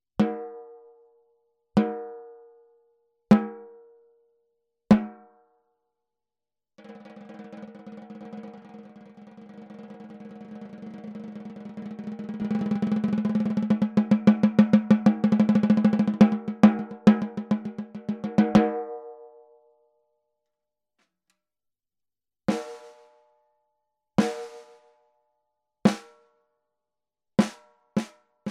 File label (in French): LUDWIG LM400 14X5, CAISSES CLAIRES